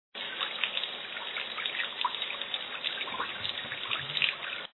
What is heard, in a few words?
water; maya miguel global groovin